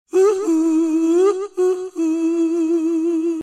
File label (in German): woo hoo sms ton; sms klingelton